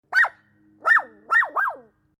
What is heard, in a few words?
Little Puppy Barking, Four puppy bark sounds, or a very small dog; Dog Sound Effects